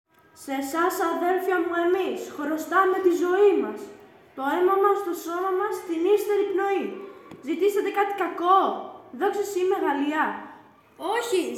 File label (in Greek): Ύμνος στα παιδιά του Πολυτεχνείου, Aπό τους μαθητές της Στ΄